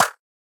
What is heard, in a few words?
warning; quatuor